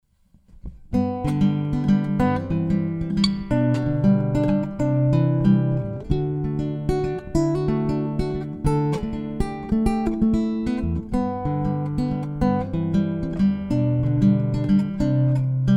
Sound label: Fishman Aura Spectrum Nylon Picking Blend Comp; LaMancha Rubi